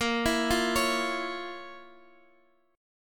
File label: F Major 7th Suspended 4th Sharp 5th A sharp